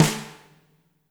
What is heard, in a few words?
WRM SNR, WARM+FAT KIT; Spectrasonics - Burning Grooves CD2